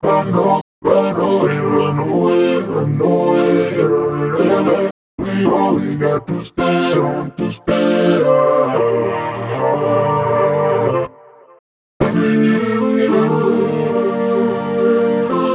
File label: songstarter 110 Gm, Pop; Slate; SD PASADENA Pop Sample Pack